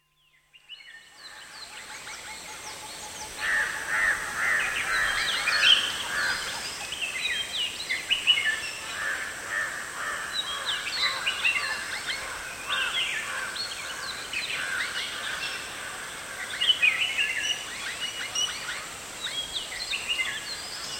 Birds at watering hole, Madumbalai National Park, Tamil Nadu, late afternoon with mynahs, barbets, parakeets, bulbuls and crickets; Outdoor Sound Effects; BBC